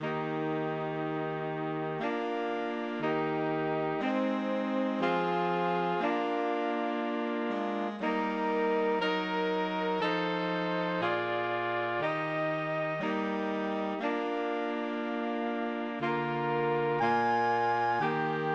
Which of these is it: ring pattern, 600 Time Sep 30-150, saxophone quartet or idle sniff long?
saxophone quartet